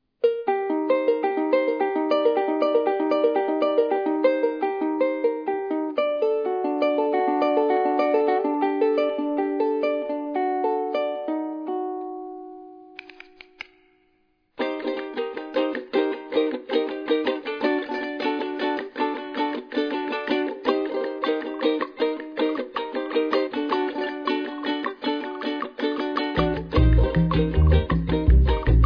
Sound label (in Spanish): Tango